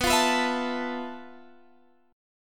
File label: F Major 7th Flat 5th B, guitar chord